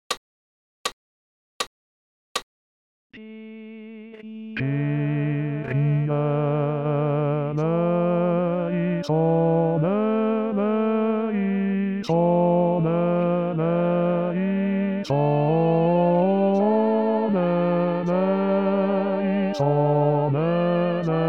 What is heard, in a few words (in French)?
Basse; Missa Brevis Kyrie; Antonio Lotti